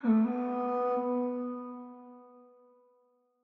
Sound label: Vox Ghost; AngelicVibes; Waves